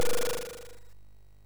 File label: SFX, MOS6581; Narco Police; MOS6581R3